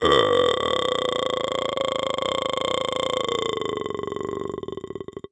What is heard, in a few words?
Horror Scary Demon Creak